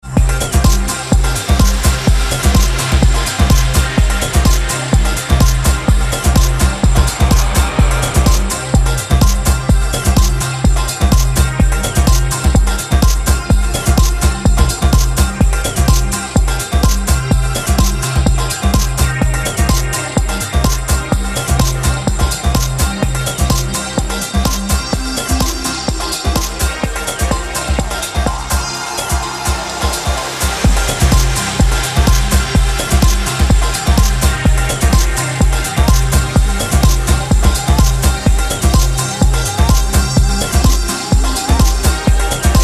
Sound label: funky remix